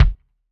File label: subtle reverb bass drum one shot f key